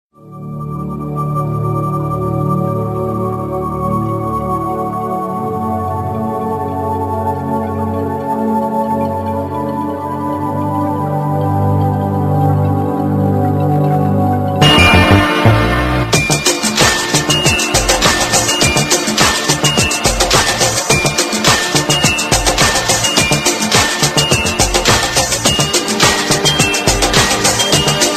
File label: Nokia Vertu; 8800; meloboom